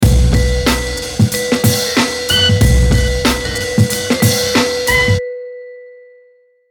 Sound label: hiphop